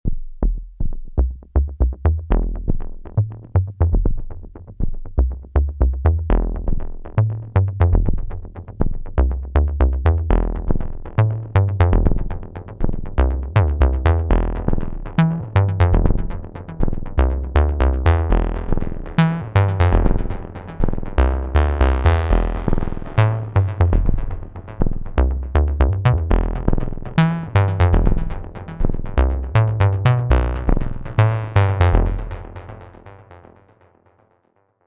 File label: kawai k3 sounds percbass external fx